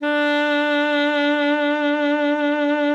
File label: ALTOSAX D4; Xsample VOL-2